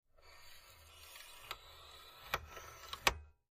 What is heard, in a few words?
Antenna; Telescoping Antenna Up And Down, Slow.